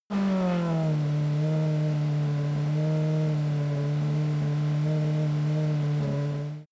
racing car f1 engine decelerate idle

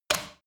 power socket plug

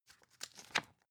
magazine open

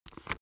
paper pull

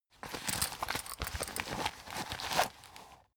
plastic bag handle aggressive

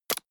medieval click light neutral

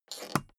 suitcase close latch lock fast

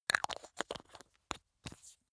glass bottle close screw

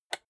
rotary control single turn

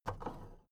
big plastic case handle take

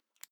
zippo close short

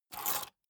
key ring large grab table

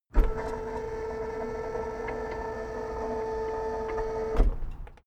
car lift up

